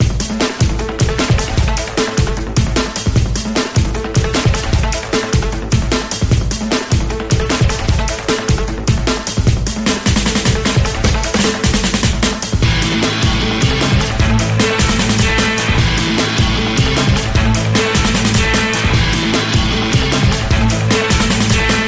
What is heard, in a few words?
Backup iPhone SE Ringtone